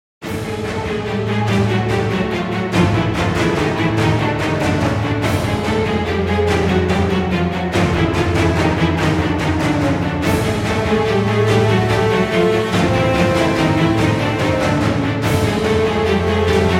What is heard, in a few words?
Epic Blockbuster, Royalty Free Music